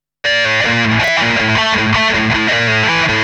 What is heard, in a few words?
ruff